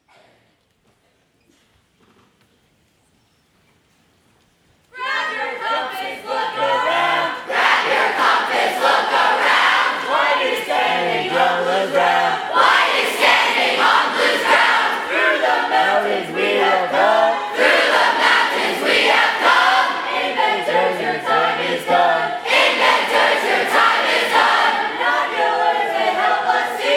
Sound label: Cheer; Blue Team